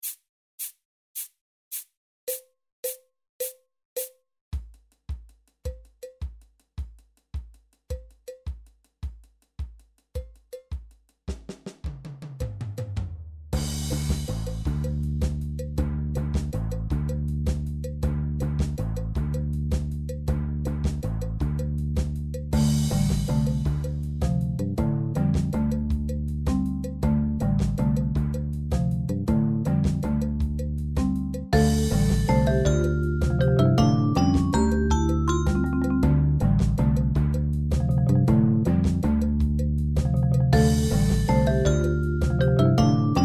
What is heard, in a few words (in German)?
Trance Danz ohne Claves